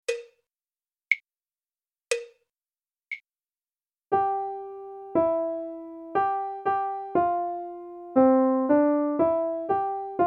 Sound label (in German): Melodie